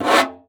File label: STREI FG, BONGOS; Xsample VOL-9